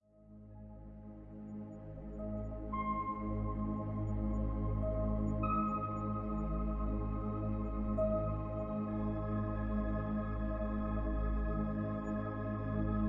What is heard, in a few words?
Music